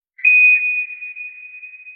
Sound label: sonar Ping Water Far Directional; Barotrauma; Real Sonar